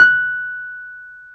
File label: PNO, Wurly